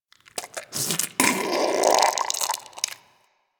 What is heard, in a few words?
Goop Squeeze Close; Warframe; Infested Eris